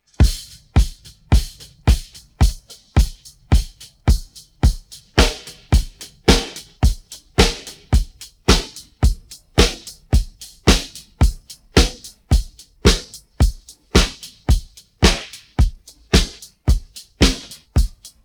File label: pattern, drums